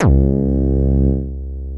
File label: PPG LOW, Bass Analog & Synth, C2; USB Soundscan vol.12; AKAI; VINTAGE